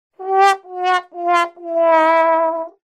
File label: trombone